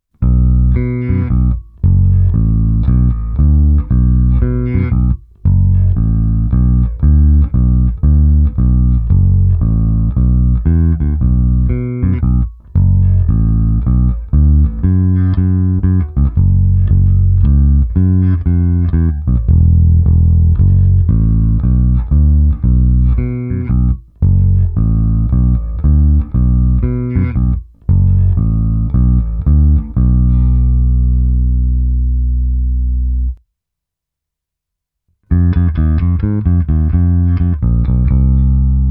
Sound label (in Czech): Fender Blacktop Precision Bass, Ukázka přes Darkglass Harmonic Booster, TC Electronic SpetraComp a Darkglass Microtubes X; s lehce staženým kobylkovým snímačem; funguje v rockové kapele